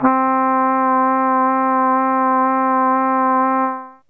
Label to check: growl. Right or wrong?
Wrong